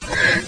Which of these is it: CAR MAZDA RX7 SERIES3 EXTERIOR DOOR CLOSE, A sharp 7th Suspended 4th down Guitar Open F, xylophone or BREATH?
BREATH